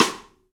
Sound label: Pop Stick, Roland BK-5 Rimshots